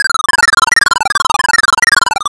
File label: starstorm